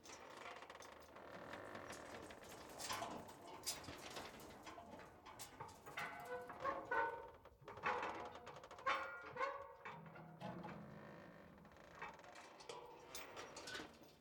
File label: Windmill; The Town